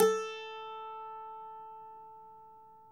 MNDLN A3; Sonic Reality; American Heartland

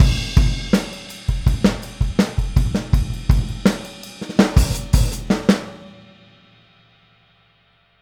Boogaloo - Outro - Full Mix, 82 BPM; Sonic Foundry; Drums from the Big Room The Mixes

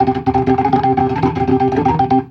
RIFF, ORGAN RIFFS; InVision Interactive

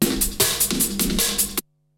Beats, Breakbeats, Techno; Syntec; Wall of Sounds VOL-2; TECHNO-BOX; 90s sample CD

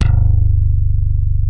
DYNA MID G1, DIGITAL BASS; Music Store - Claudius Diemeter Schwerlos

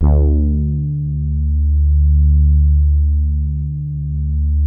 soft brass, poly mid D2; Music Store - Claudius Diemeter Schwerlos

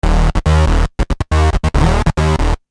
Syn Bass 140 D#; SynBs140bpm; Sonic Foundry (Sony Creative Software); Techno Synth Loops 1 Signals I

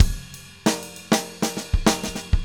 LOOP, drum; 90s sample CD

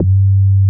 MOOG LOW G2, ANALOG BASS; Music Store - Claudius Diemeter Schwerlos

